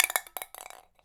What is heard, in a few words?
Ping Pong Rattle, Large Wine Glass